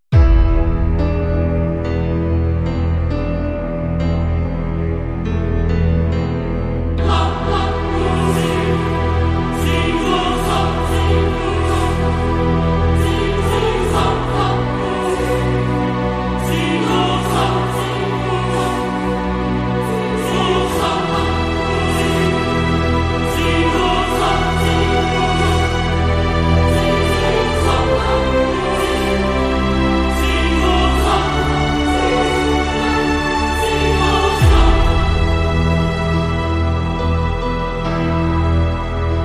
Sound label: underscore